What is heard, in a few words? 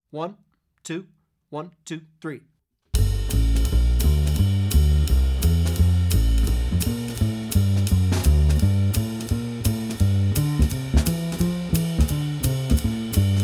piano without soloist